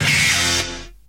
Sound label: bullet from a gun